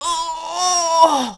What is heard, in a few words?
DYING; BattleSNDS